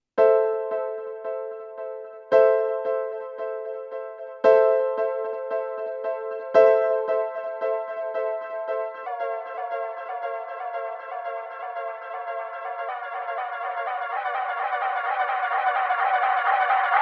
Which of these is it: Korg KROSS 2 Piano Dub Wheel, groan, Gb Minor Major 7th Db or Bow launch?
Korg KROSS 2 Piano Dub Wheel